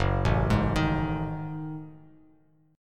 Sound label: F sharp Minor 6th Add 9th, Bass Standard; arpeggiated; JGuitar